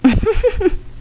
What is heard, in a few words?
giggle